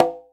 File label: Conga Open Slap; Planet Earth; Digital Sound Factory Planet Earth